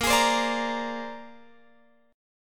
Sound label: A sharp 9th A sharp Guitar Open F; strummed